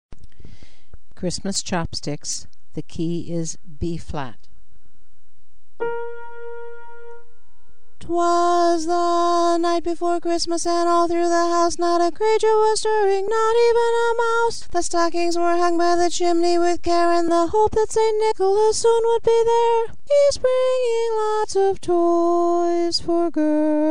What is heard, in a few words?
Xmas Chopsticks Tenor Dominant; holiday music